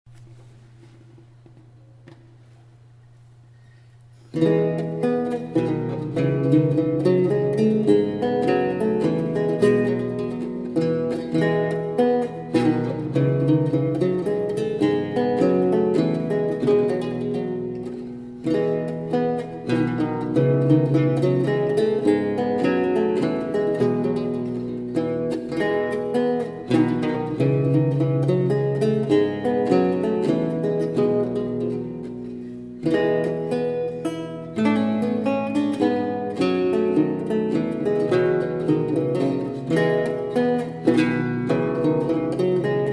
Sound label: menuet